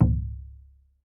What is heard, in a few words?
PUEBLO DR, American Natives; E-MU Formula 4000 Series Vol. 4; Earth Tones